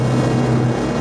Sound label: tank engine; Tron